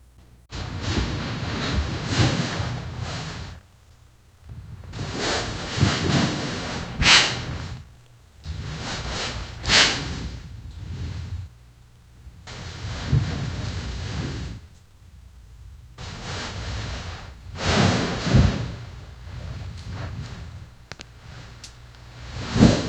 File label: fast intimate breaths